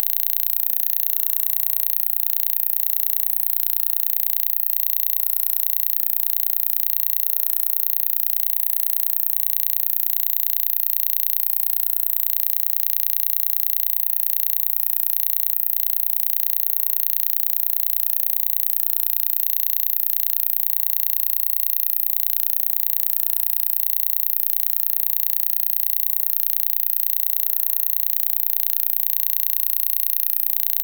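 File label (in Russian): писк стеги, squeak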